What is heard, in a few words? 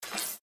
glitch; 雑音（GLITCH）; ぐちゅっ; 画面切り替え 画面から消える時の音 クリックの無効音